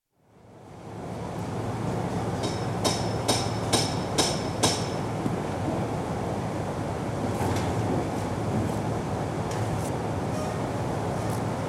Building site, interior acoustic of building under construction with bricklayers in foreground; Construction Sound Effects; BBC